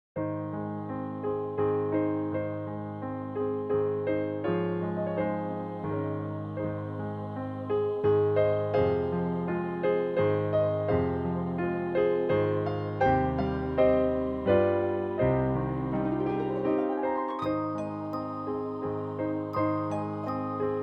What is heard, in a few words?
Waltz A flat